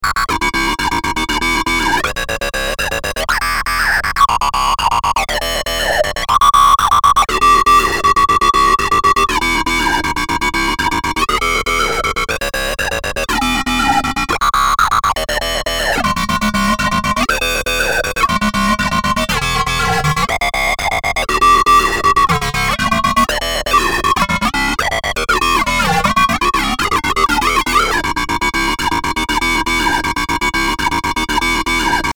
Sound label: hard flange; audioblast